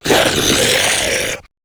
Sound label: zombie vox male runner run front; tf; slender; rave in the redwoods